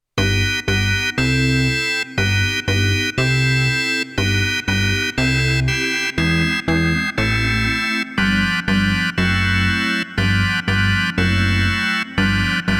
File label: Augmented Organ